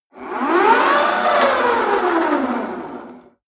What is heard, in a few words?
rope trainer pull sequence fast short; sport freizeit; fitnessstudio